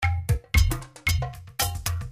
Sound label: Tabla Groove 115